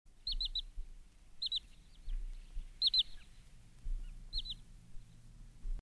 Bosruiter, trekroepjes

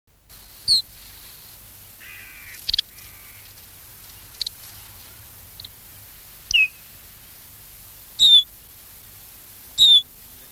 ijsgors; tijdens de vogeltrek

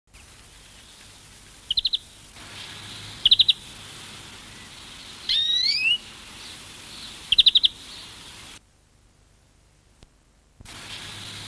groenling, trekroepjes; tijdens de vogeltrek

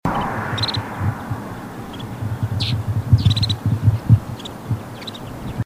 Sneeuwgors, trekroepjes; tijdens de vogeltrek

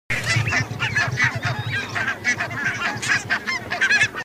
Brandgans, trekroepjes

